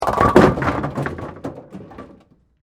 Bowling Pins, Sports, Bowling, Pins, Knock, Over, Close, Perspective; Bowling Sound Effects